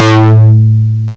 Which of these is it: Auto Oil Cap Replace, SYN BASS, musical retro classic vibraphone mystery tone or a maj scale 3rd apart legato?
SYN BASS